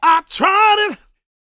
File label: GOSP MALE, Vocal Planet Gospel